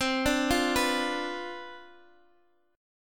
C Major 7th Suspended 2nd Suspended 4th C down Guitar DADGAD